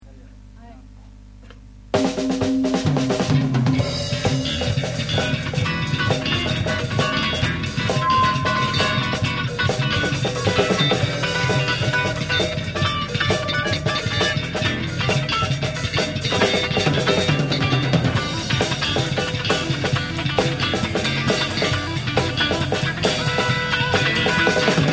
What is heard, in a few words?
bass solo